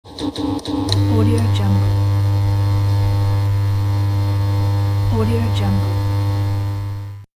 neon lights on

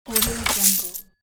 cash register

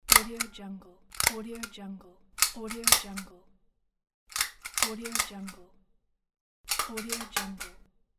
stapler

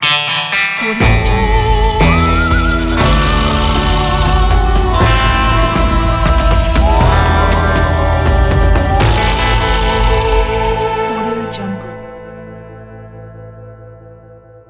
wild west logo; andyslatter

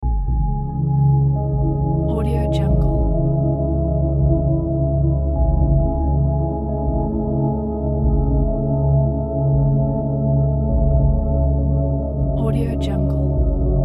sad atmosphere

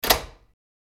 lock dead bolt click; hollywoodedge

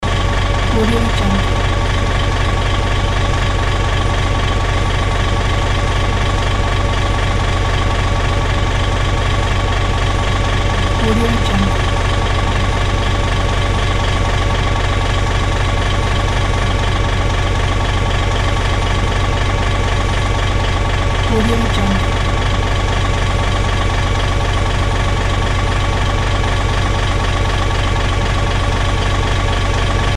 bus engine; davidbeardmusicproduction

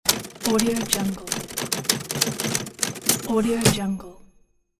typewriter

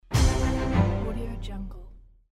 attention music sound

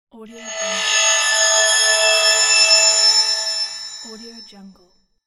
teleport

